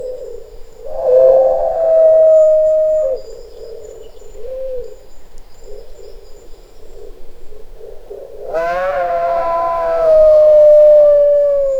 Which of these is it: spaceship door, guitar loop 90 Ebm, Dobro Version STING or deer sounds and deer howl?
deer sounds and deer howl